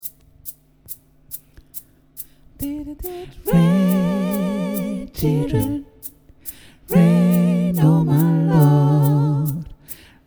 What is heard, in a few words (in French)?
Basse, Gospel; Didnt it rain